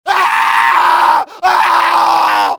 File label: scream, pain death, male; Soldier Of Fortune II Double Helix; XBOX